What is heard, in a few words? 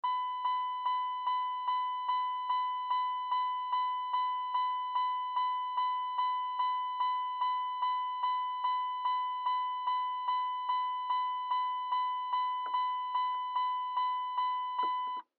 Saturn Vue 2007 Door Ajar Alarm; Transportation Automobile; Car Sound Effects; Blastwave